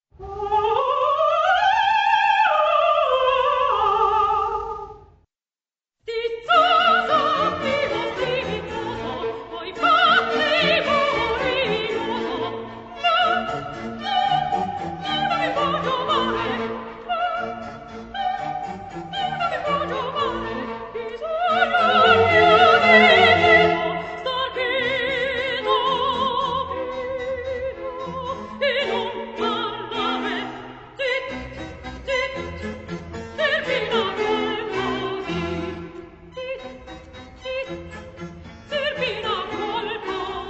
soprano

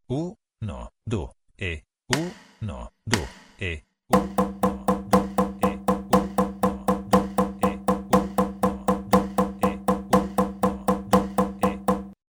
esercizio metronomo e tamburo

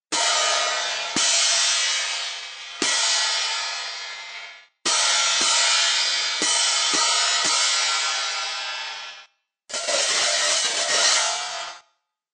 piatti

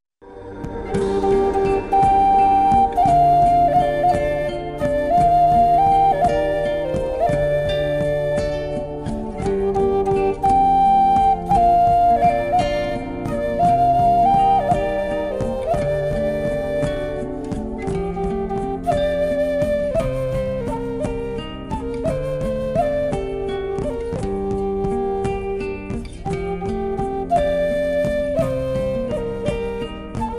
quena

